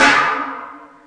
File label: can; drums